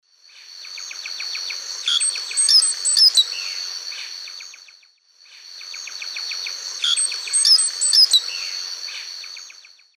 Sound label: Molothrus oryzivorus, song; S. America; Eastern Lowlands